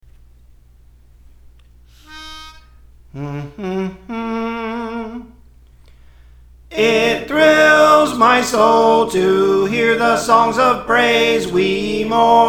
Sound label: The New Song Soprano